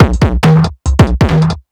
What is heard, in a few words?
Breakbeat; Vengeance Samples; VEC2 Loops